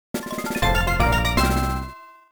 Item Collected; Super Princess Peach

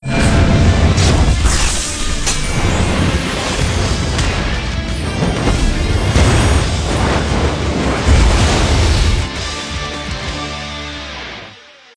Battle Music; Zak Storm Super Pirate; mobile; Battle Blazz Music

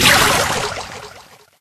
water; misao

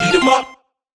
Notification; Puffsnet; puffstale soundtrack 2020

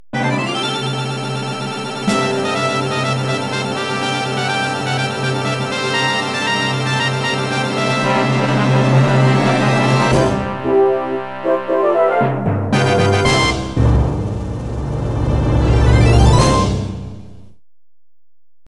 Certificate Fanfare; Legend of Zelda The Four Swords Adventures Original Sound Version; Upper Class Rules Certificate Fanfare

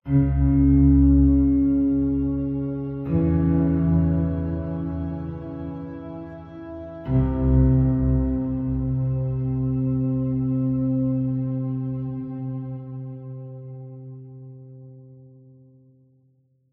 Dark piano Sample

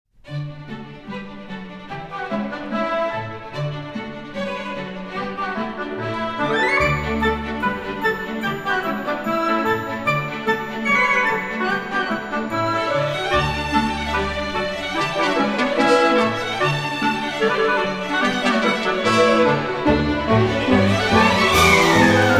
scherzo for orchestra

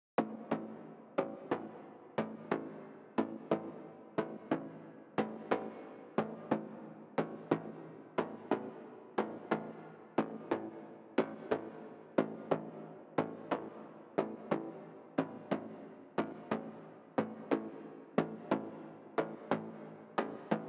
Bass

